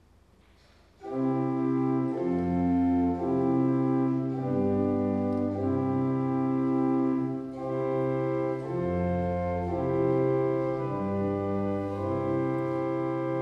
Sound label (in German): kadenz dur